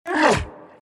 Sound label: grunts; abyssal underlord